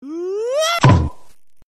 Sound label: smash window